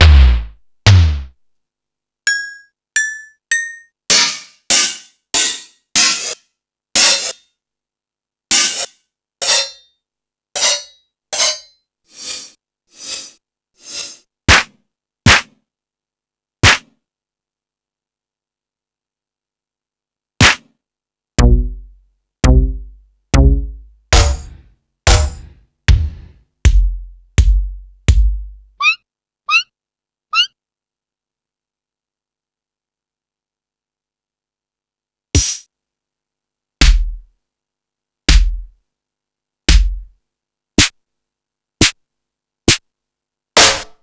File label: EMU Pro-Cussion